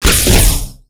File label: Gun Skin